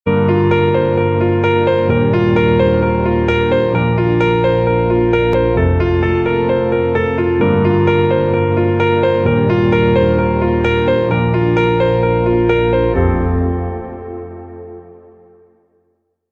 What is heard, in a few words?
Spacial Background Music; Short Video